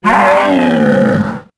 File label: Roar, Dino; Anky